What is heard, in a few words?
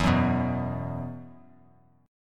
E Suspended 2nd E down, Esus2 chord; strummed